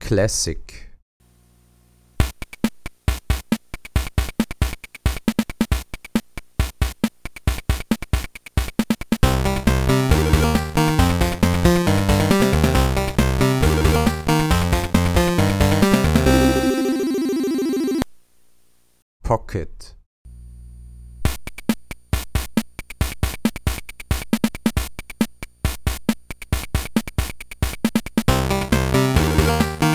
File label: gameboy comparison lsdj